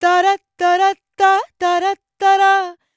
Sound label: World Voices, INDIA; ILIO; Vocal Planet